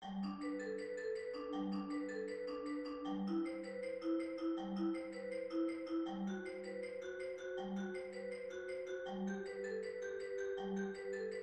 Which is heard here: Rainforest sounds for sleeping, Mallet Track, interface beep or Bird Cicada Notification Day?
Mallet Track